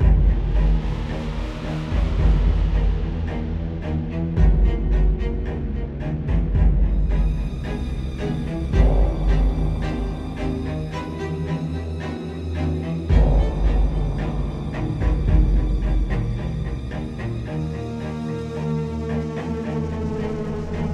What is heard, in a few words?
Scary Music; GGJ22